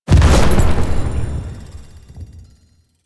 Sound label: Horse Summon; Foley Actions; Warframe; Vehicles; Duviri